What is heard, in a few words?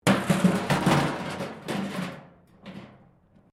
metal rubbish bin smash concrete